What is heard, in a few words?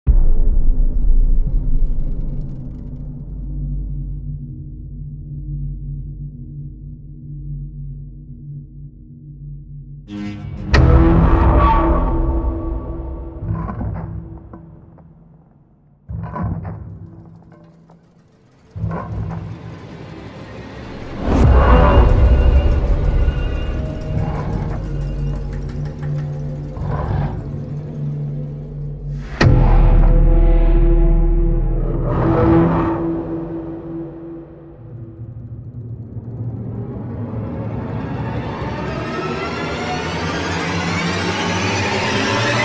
epic hybrid horror music